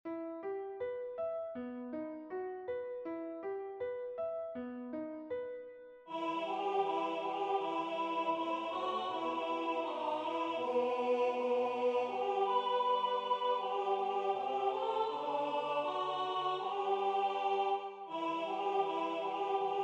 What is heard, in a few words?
alto